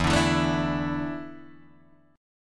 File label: E 7th Suspended 4th, Guitar, DADGAD